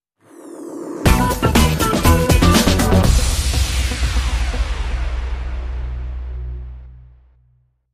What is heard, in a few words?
Tutti Flutey